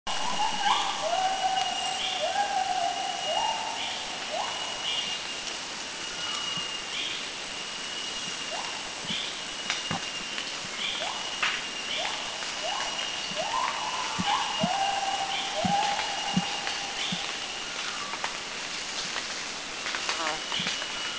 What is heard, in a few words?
White-handed Gibbon; Hylobates lar; Thailand; Krung Ching